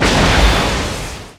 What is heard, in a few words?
Rocket Launcher Shot; SFX Kit; Weapons